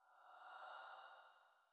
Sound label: Spirits Breath, Magic; FoundryVTT Ready Quarantine Bundle V3; adventurers home base